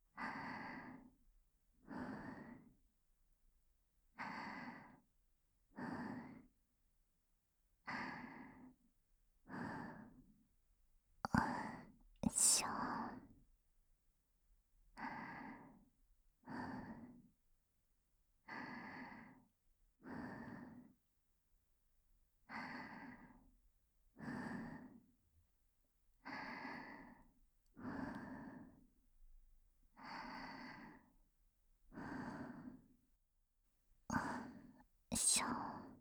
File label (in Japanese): 吐息のみ; ASMR